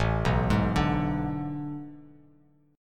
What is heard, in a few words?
A Major 7th Suspended 2nd Suspended 4th A down Bass Standard; AM7sus2sus4 arpeggiated; JGuitar